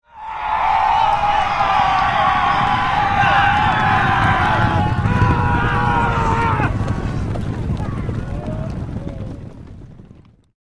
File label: 100 Horses Charge By With Yell; Historical; Hollywood Edge Historical Series; War Horses Armies & Weapons